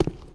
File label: stone